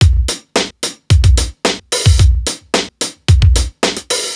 Hip Hop Beat, Drums; Digidesign; Pro Tools; Pro Tools Essential Loops; Drumbeats

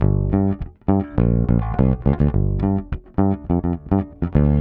103 Old School Bass G, E-Bass; Pop Rock; Pro Tools Essential Loops; Digidesign

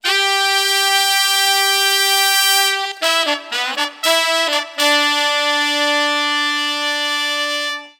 120 Roots Reg Horns; Digidesign; Pro Tools; Pro Tools Essential Demos; Essential Loop Demos; Demo Session - World

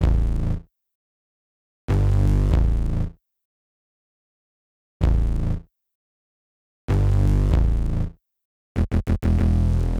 HipHop Synthbass Amin; Digidesign; Pro Tools Essential Loops

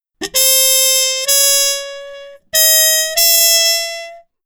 095 Acid Jazz Trumpet _Cmaj; Brass; Pro Tools Essential Loops; Digidesign

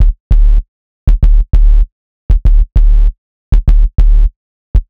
HipHop Synthbass D#Min; Digidesign; Pro Tools Essential Loops

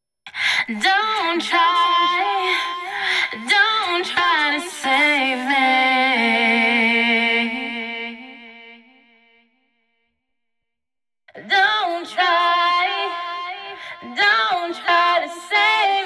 Chorus Vox; Pro Tools Essential Demos; Digidesign

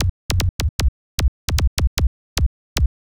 152 Techno Kick; Dance DJ; Drums; Pro Tools Essential Loops; Digidesign

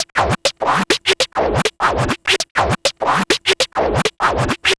Vinyl Scratch Snare; Digidesign; Pro Tools; Pro Tools Essential Loops; Dance DJ

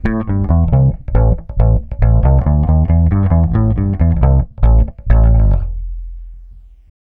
Garage Bass Amin; 137; Dance DJ; Digidesign; Pro Tools; Pro Tools Essential Loops

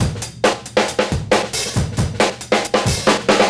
137 Garage Drum Loop; Dance DJ; Drums; Garage Breaks; Pro Tools Essential Loops; Digidesign

